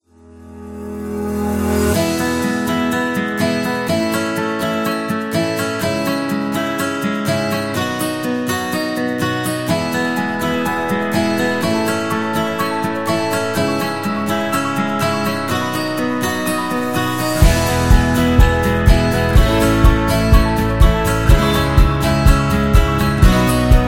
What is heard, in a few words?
It Is Folk, happy music